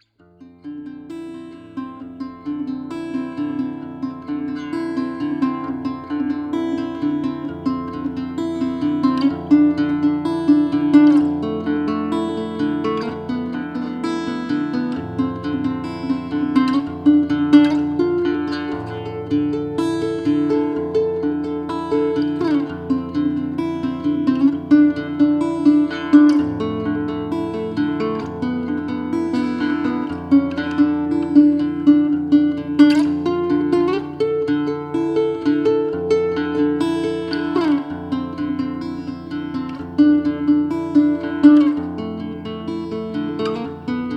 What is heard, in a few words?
Guitar Mix